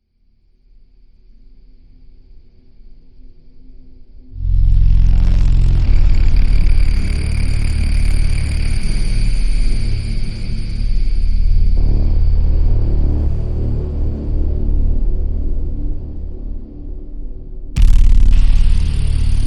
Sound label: ambient bass stabs 80bpm